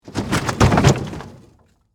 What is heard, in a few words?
Impact Wood Object, Fall, Over, Heavy; Materials; Blast Wave